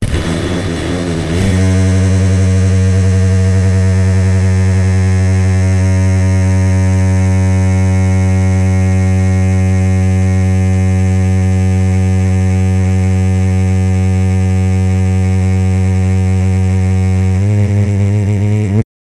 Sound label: jingle